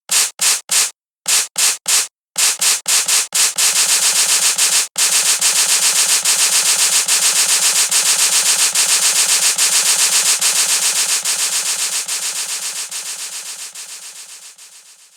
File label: breakdown; lbelle